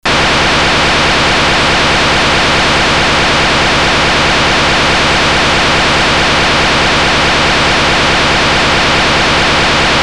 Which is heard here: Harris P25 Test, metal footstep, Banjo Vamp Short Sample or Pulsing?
Harris P25 Test